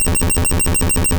Thunderbolt

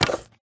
bow crossbow place arrow load; Guns Weapons Bow Arrow

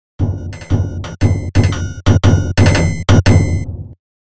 Title Fanfare; Ganbare Goemon; The Super Famicom Collection

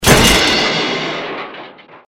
Metal Joint Break; Bad Piggies

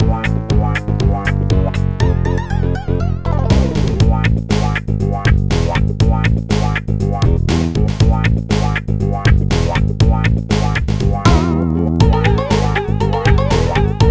Lose Competition; Platinum Sudoku; nds

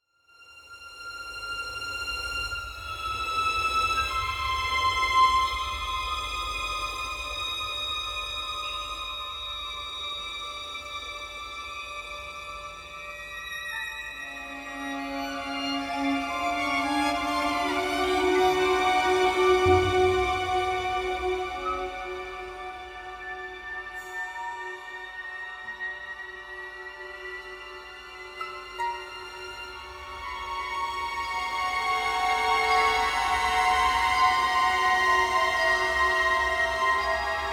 Mood Nazi Creepy

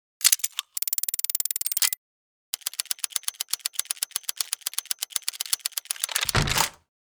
Cross Opens

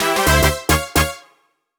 Jingle Match Point; clubhouse games 51 worldwide classics 2020 switch gamerip

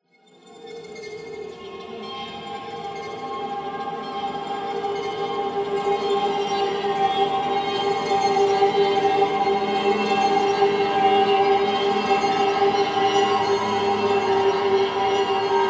High strings Sample; The Sinking City

